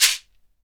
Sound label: SHEKERE, Synclavier Percussion; ILIO